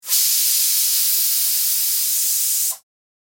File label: Cooking steam release hiss burst